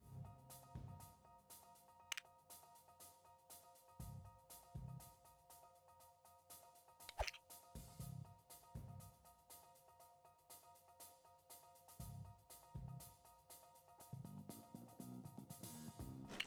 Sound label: Newsreport Intro SFX; lego the incredibles 2018 switch; Cutscenes - Hubcitypark